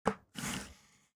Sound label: selection, ChromeVox Earcons No Reverb